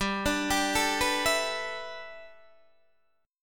G 6th Add 9th G Guitar DADGAD, chord

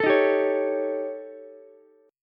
D sharp 7th Flat 5th, D sharp, down, Ukulele; strummed; JGuitar

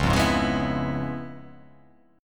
C sharp 7th Sharp 9th, C sharp, down; strummed; C#7#9; JGuitar

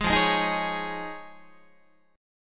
F Minor 7th Sharp 5th Guitar, chord; Fm7#5 strummed; 4A,4E,5A,5D,5G#,6C#; 11,13,11,13,12,12

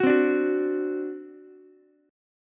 C Suspended 2nd Flat 5th C down Ukulele; Csus2b5 strummed

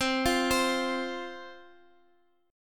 F 5th C, chord

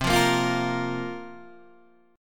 C 7th Suspended 4th, Guitar, DADGAD

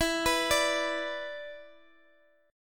B-Minor Double Flat 5th E

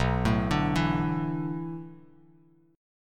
B-Minor 11th, Bass Standard; arpeggiated; JGuitar

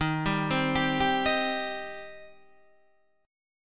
D sharp 6th, D sharp, down, Guitar, Open D

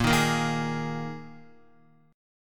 Bb 7th Suspended 4th Bb Guitar 4F,4A,5C,5G,5C,6E; x,1,3,3,3,4; Bb7sus4 strummed; JGuitar

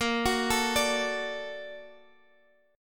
Bb Augmented Major 7th Bb down Guitar Standard; Bb+M7 arpeggiated; JGuitar

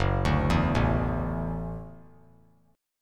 Gb Minor 7th Sharp 5th; arpeggiated; JGuitar

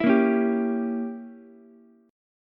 Bb Diminished 7th, Ukulele; strummed; chord shape

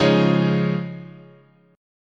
Db-Major Add 9th, chord, guitar

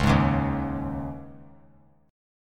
Db Suspended 2nd 3C,3F,3A,3D; strummed; Dbsus2; JGuitar

